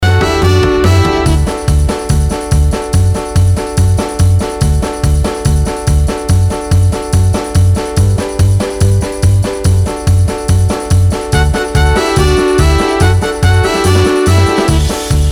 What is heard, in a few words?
Karaoke Song